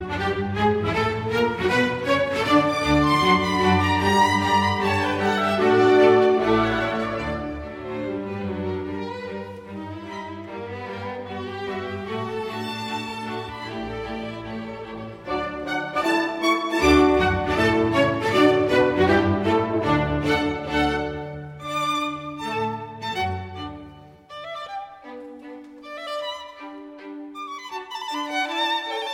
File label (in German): violinkonzert nr 2 d dur kv 211 allegro moderato